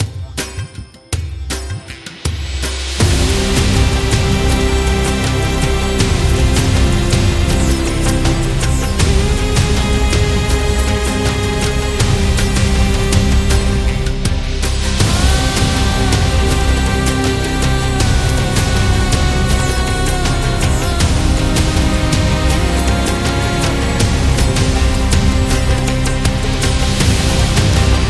Indian Cinematic

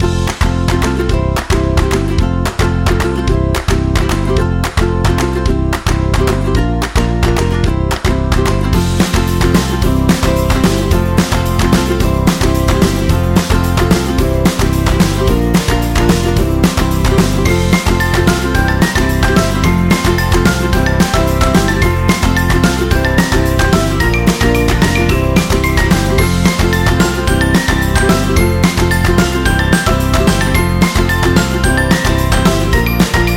Cooking Loop

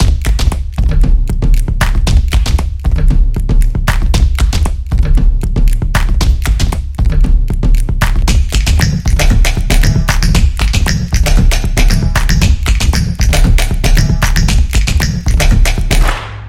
Drum Beat

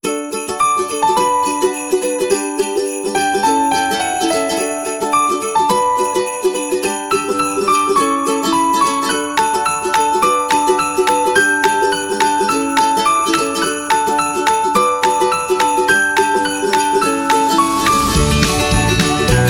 Christmas Beat

